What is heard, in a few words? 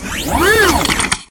helm on